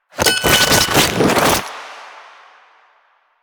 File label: Grenade Prep; Warframe; Lasrian; Lasrian Soldiers; Weapons; Lasrian Goonadier Grenade